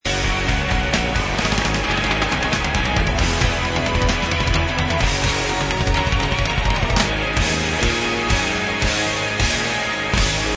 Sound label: Drum Fill